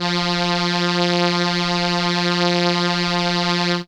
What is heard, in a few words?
Trumpet F3, ARP Solina; musicradar-synth-samples